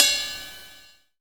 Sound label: RIDE CY; E-Lab; X Static Goldmine 2; akai